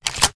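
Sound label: empty start fast underload, shotgun; reloads; iw8; romeo870; gmod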